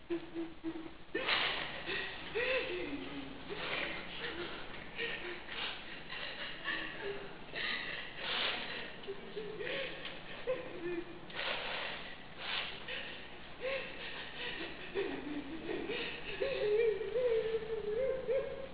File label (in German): heul